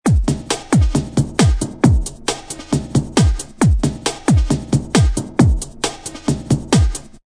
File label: break 135